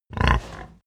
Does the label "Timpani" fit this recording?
No